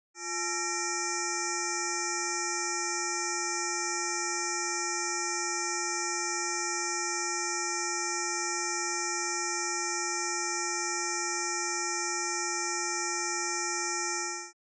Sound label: Multitone signal, 500 Hz spacing with gaps; Stereophile Test CD, Vol 3